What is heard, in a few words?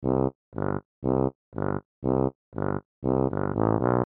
March Low Loop; Musical; Cartoon